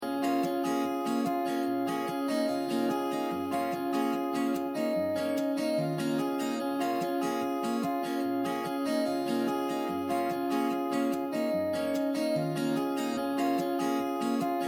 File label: Acoustic Movement; Variante